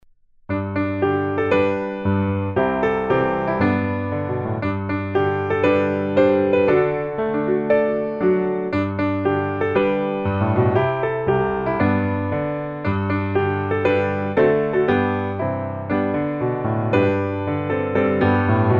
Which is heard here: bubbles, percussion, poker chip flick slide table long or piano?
piano